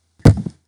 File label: Limb Falling Off